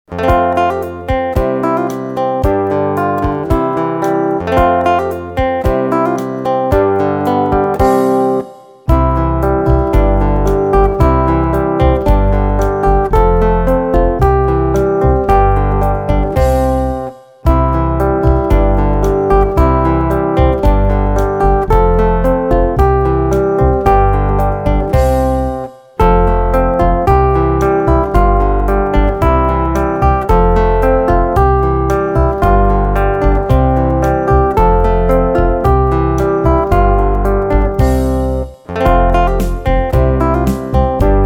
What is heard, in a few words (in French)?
version instrumentale